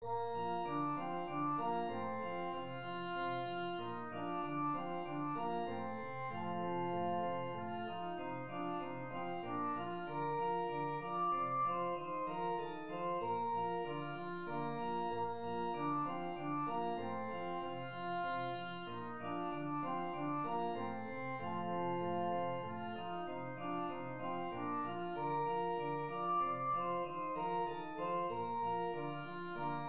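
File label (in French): danse allemande, si bémol majeur; haydn joseph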